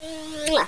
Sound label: kiss